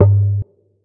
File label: TOM, Jungle; Gorge Sample Pack Vol.1; San Gabriel